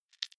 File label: click noise, signale